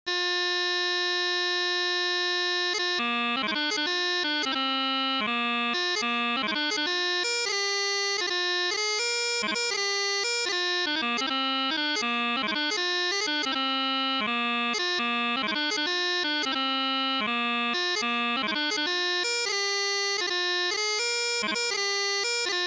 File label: Blue Bonnets Chanter